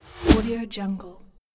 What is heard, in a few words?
whoosh; escarsound